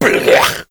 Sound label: rage scream growl shot bleah